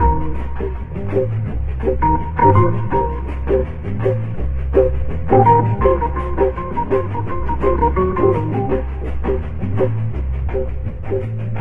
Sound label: keys